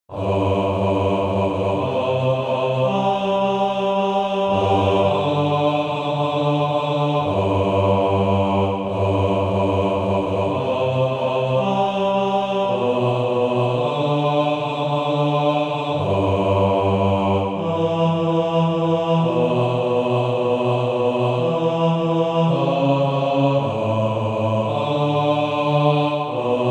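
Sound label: bass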